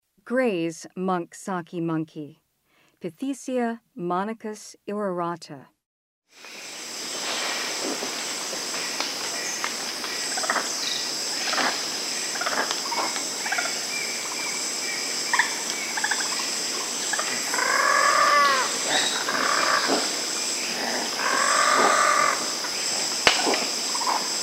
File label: Gray's monk saki monkey; S. America; Rainforest; Primates of the Rainforest